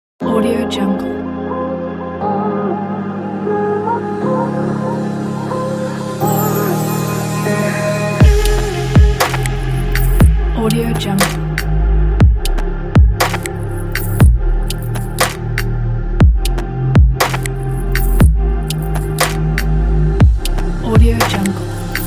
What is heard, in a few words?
abstract ambient background atmosphere